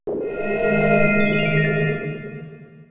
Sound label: power up; 5element